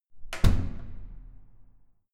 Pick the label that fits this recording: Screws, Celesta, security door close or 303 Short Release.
security door close